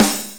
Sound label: drum hit clap